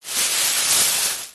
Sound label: foley plastic bag thin empty movement rustle handling short